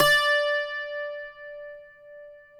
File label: CUATRO; Spectrasonics; Hans Zimmer Guitars VOL-2